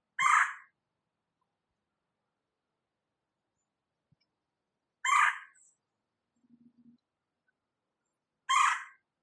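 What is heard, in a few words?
Red-bellied Woodpecker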